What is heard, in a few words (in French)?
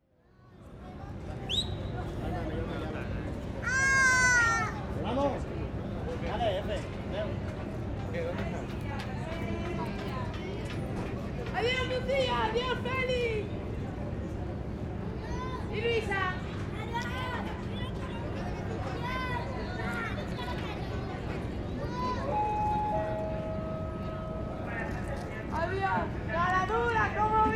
port barcelone ensaimada sobrassada